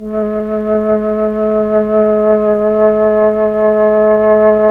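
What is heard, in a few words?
Mellotron Flute A3; Tron Flutes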